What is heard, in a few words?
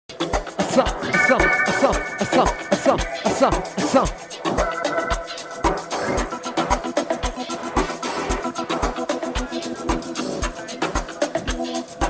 Amapiano Mix